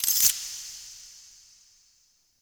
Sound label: Choice Open, UI